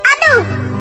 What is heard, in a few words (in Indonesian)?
aduh